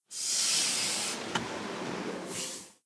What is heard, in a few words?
Bus Door; blue archive